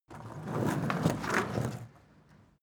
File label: skateboard indoors drive heavy; sport freizeit